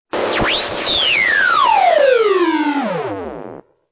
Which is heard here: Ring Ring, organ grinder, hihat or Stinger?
Stinger